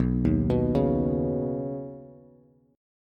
B Major Add 9th B Bass; Badd9; arpeggiated; JGuitar

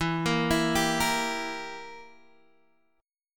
E Diminished down Guitar Open G

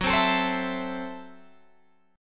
F sharp Minor 7th Flat 5th F sharp Guitar Open G

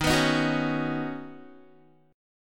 D sharp Minor 7th Sharp 5th, Guitar; strummed

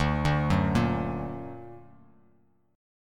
D Augmented D down Bass Standard

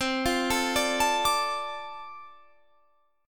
D-Minor 7th C, chord

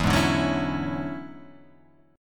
E 13th, Guitar, Half Step Down

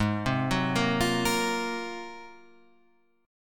Ab 13th Ab Guitar Half Step Down

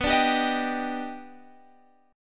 Eb Augmented Major 7th B

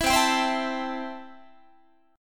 C 6th C down Guitar; strummed

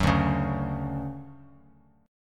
D-Major 7th D down Bass Standard; strummed; JGuitar

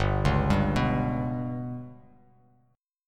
G Major Flat 5th chord; GMb5 arpeggiated; JGuitar

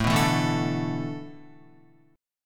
A Suspended 2nd Suspended 4th, Guitar; strummed; JGuitar

